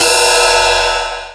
Linn, LinnLinn Drums

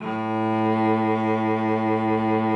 A2, cello vib; Xsample VOL-7

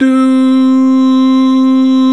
VOX, Male Scats

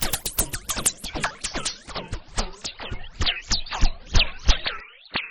loop, techno atmos

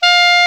KLARINET, Alpine Volksmusik; Best Service

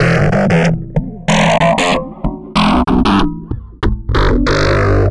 Distorted Bass, Electric Bass; Sonic Foundry (Sony Creative Software); Leo Cavallo Bass Taster

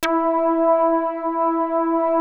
Prophet 5, E5; Hollywood Edge - Giorgio Moroder Rare Synthesizer Collection

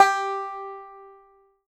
banjo G4, banjo notes, country; Sampleheads; Whole Lotta Country 1 & 2

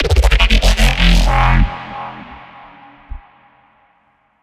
bass hit cinematic F; Virtual Riot

